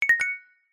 multimedia notification bright metal tuned mallet short subtle; zapsplat